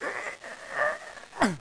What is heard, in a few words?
priest Struggle Fade; NETSTORM